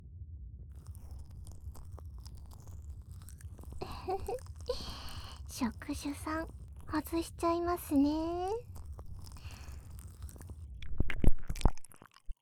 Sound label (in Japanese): つまみ食い（耳舐め）; 耳奥触手姦; 双子予備催眠セット; 催眠音声